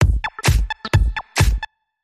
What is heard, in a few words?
Sting